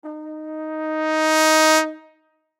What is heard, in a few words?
Trombone Swell, Trombone Eb Swell; Brass Sound Effects